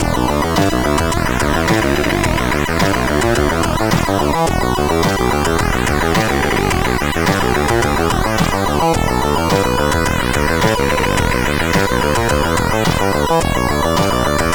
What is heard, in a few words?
Test Long Sequence, CSG8580R5; sid; Driver 14